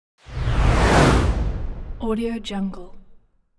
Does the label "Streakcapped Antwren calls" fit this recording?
No